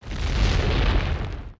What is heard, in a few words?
Dead Hand Appear; zelda; OOT